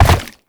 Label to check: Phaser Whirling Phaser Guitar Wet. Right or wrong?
Wrong